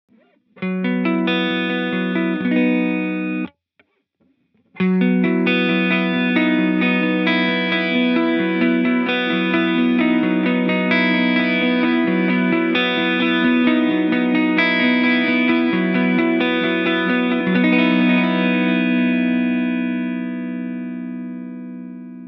Mod Panning Delay

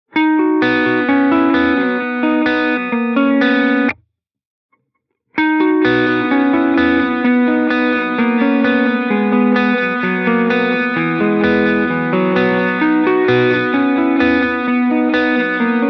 Tape Old Tape

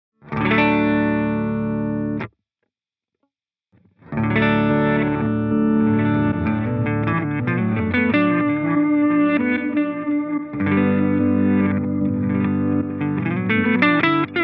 Reverse Reverse Delay

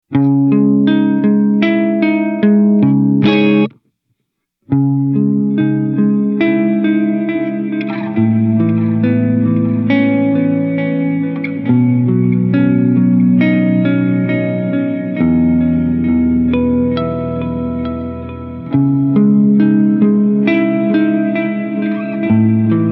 Delay Blendable Big, reverb

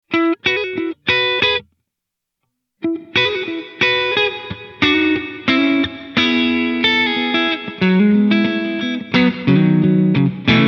Plate Classic Noon

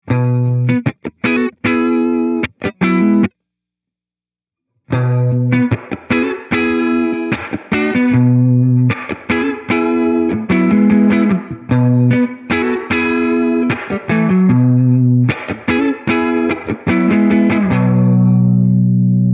Glitch Hi Pass, reverb

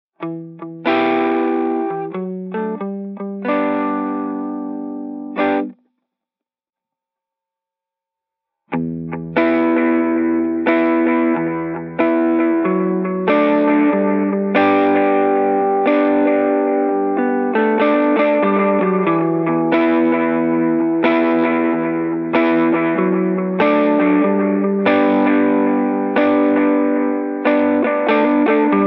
Lofi; echosystem

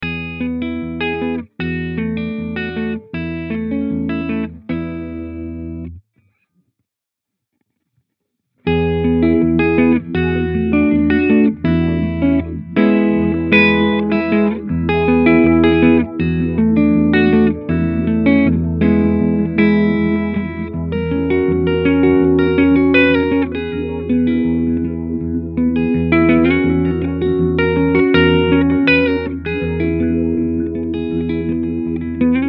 Filter Pulse

